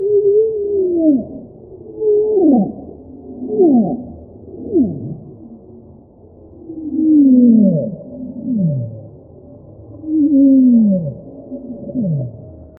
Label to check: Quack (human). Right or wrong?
Wrong